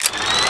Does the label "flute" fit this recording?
No